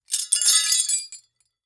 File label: Glass Smash Debris; Fairlight Sound Lib